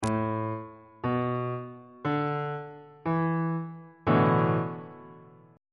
e dominant 7th suspended 4th 1st inversion on bass clef